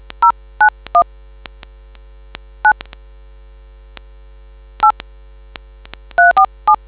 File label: dtmf